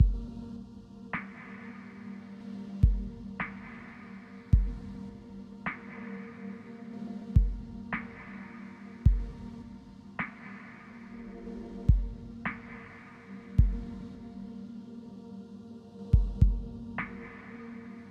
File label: Tension Drums Curve